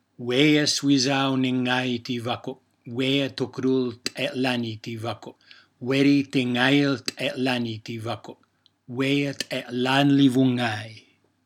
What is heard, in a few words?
Chorus